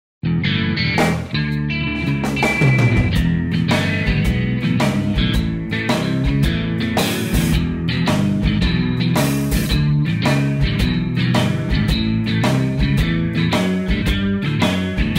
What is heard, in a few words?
blues backing track; bluescontest; wedstrijdversie